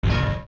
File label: Steps Iron Boots